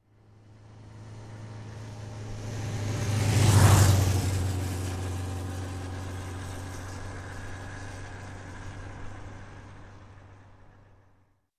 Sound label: Harley Davidson passing by